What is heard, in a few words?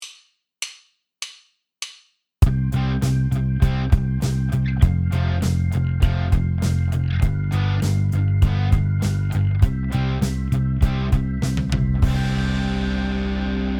bass